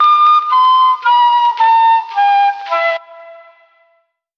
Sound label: flute, Jazzmatazz loops